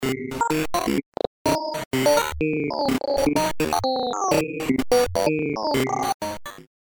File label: Glitch